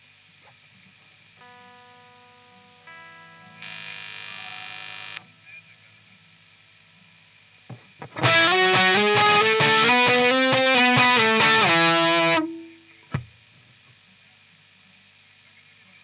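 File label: guitar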